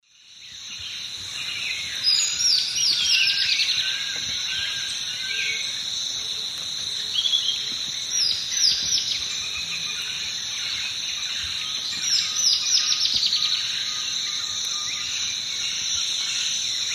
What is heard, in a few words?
Microrhopias quixensis, song, S. America Eastern Lowlands